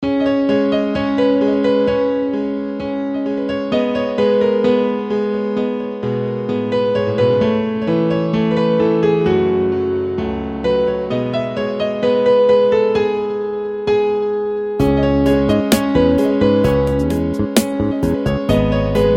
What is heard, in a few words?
Melody Piano